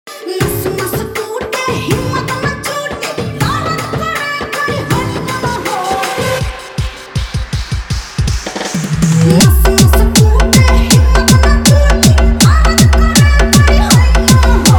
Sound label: Hard Vibration EDM Mix